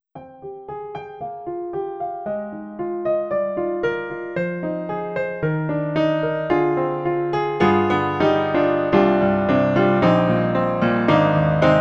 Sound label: Acoustic Grand Piano; YELLOW TOOLS INDEPENDENCE PRO 3.0